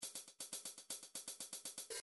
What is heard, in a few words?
funk cymbals 1 bar, midi